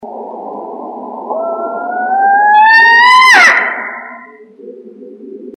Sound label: saw wheat owl scream